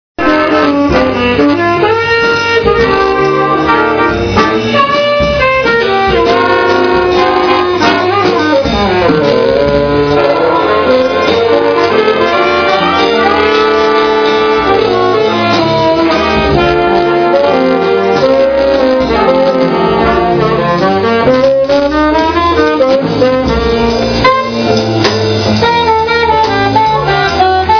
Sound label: mushroom jazz band, jazz club; barga jazz club